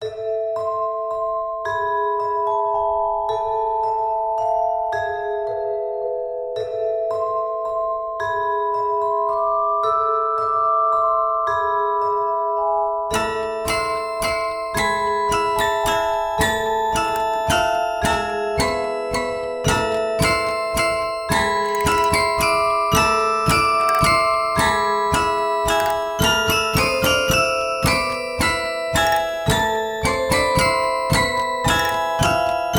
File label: kowareta music box